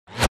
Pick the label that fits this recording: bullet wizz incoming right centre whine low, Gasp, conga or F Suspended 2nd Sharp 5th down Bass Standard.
bullet wizz incoming right centre whine low